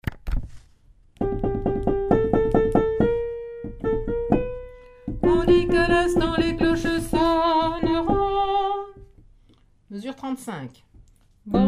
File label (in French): basse